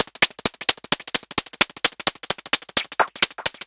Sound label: Modular 130BPM